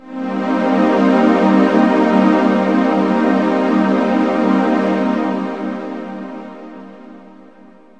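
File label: solar chord, pads; instruments single samples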